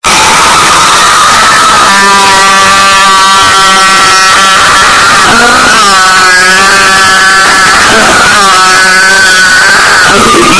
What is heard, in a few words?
screaming loud as hell; ishowspeed